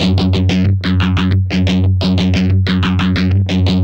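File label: GUI L1 F#, guitar; Best Service Gigapack 2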